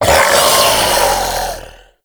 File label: Infested Femur Shoulder Fire Start; Warframe